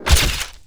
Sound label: Pickup Special Film